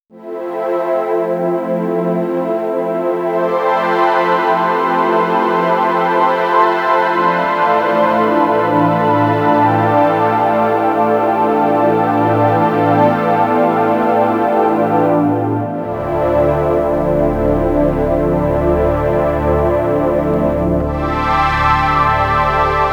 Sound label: fm8 pad